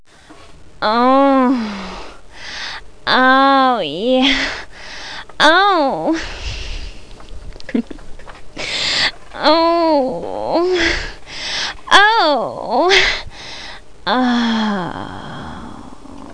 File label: ooh; SuperModels - Exotic and Wild Fantasies